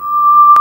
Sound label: flute D5; Ableton Foundation FreePacks; Foundation Glitch Toys & LoFi